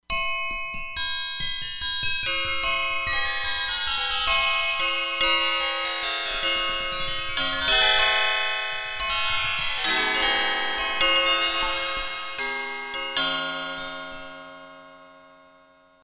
Windchimes; Factory Presets; E-mu Proteus-2

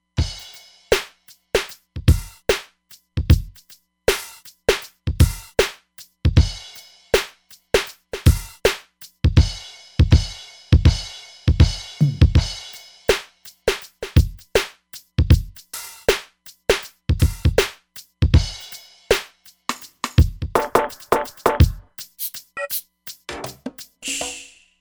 R&B Kit; Drums

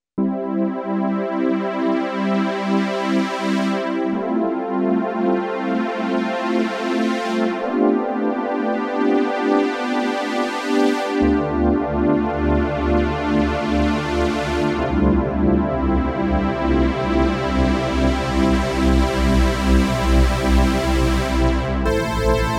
Early '80s Melancholic AnaStrings, Oberheim OB-8

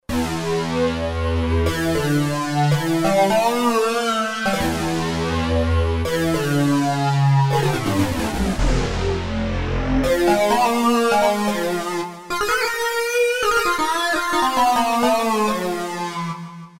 Wavesync, vintage synth; Roland SR-JV80-04

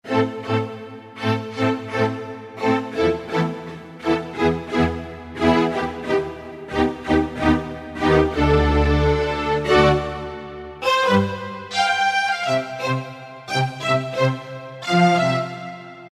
String Section, Roland PN-D50-03

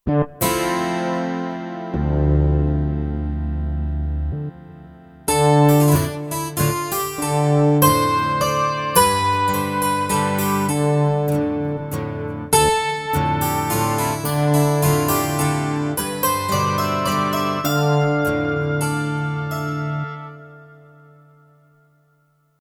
Folk Comper, Kurzweil K2500X

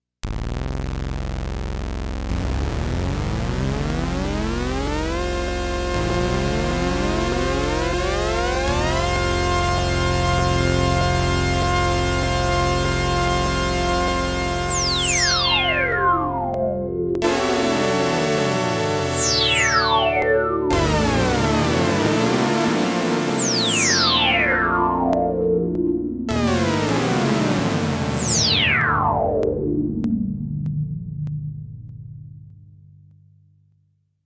Poly Port

